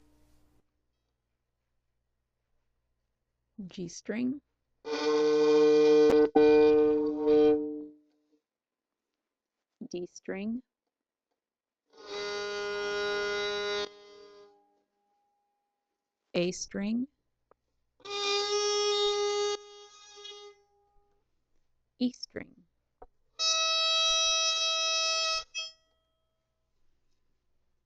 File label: fiddle test